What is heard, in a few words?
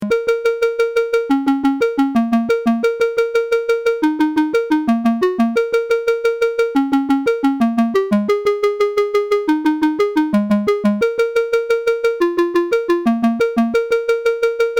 FX Scale